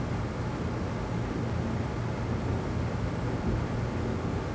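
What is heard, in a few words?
ambience room small; Star Wars Knights Of The Old Republic II; XBOX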